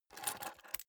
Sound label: key ring large grab table soft